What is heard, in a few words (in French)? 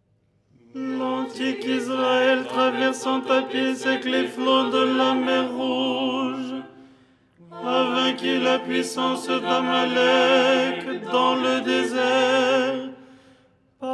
tenor; 1er hirmos du canon du dimanche, 1ère mélodie bis (petite variante à la fin).; Les 8 tons en français; ton 4